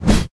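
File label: attack hammer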